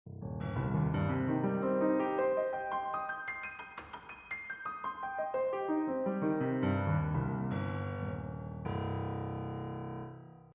percussioni pianoforte

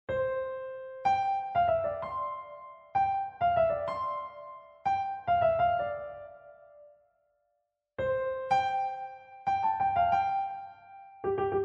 quinta giusta

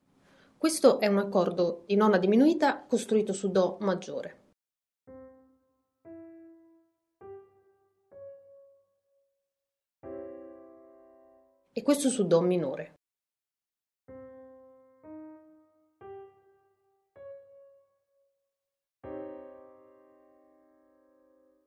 accordi nona diminuita

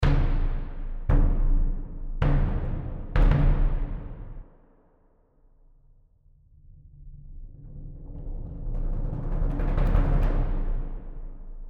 percussioni bassdrum

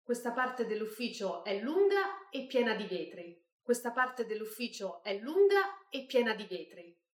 togliere riverbero voce

